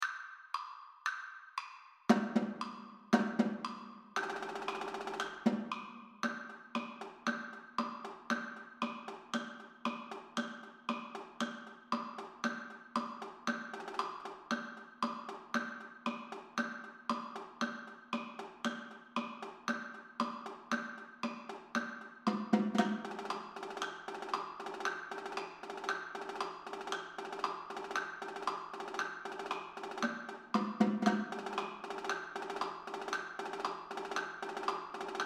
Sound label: Marching Tenor Drums; Quads Only